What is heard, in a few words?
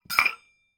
household 2 metal dessert bowls hit clang together